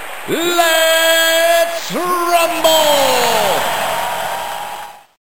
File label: rumble